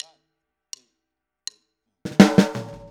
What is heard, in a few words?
Boogaloo - Snare, 82 BPM; Drums; Intro; Sonic Foundry; Drums from the Big Room The Mixes